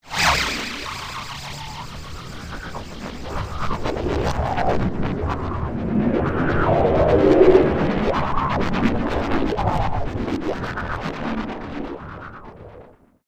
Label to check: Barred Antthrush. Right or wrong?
Wrong